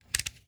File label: Scalpel; Office hits; Nikko Barrera-Amaya